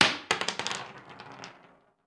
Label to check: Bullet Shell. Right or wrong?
Right